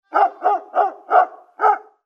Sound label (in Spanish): perro